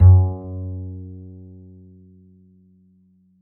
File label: D string upright bass; Upright Acoustic Bass